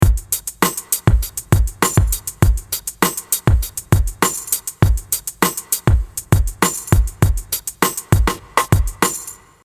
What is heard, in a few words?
Beat